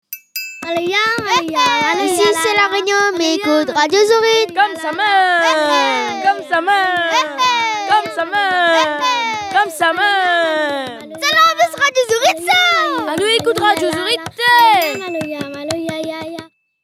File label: Jingle Radio, Maloya; Radio Zourite